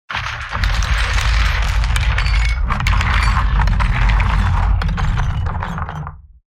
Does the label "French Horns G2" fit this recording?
No